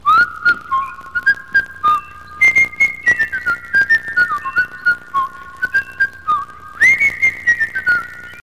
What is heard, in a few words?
whistle